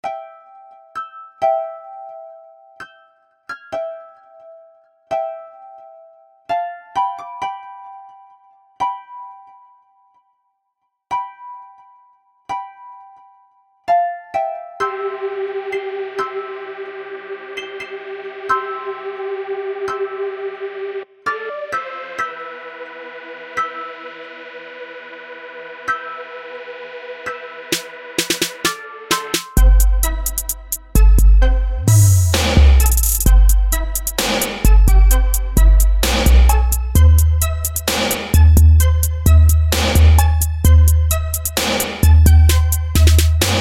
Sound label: moogish